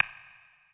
Side Stick

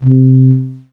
Yamaha PSS-170 Alpenhorn C3

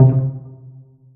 Roland JD-990 Pizzicato Strings C4

